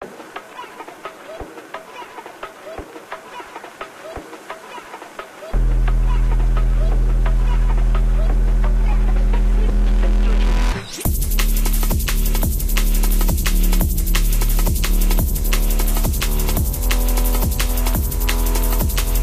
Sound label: old dnb; casualtiesunknown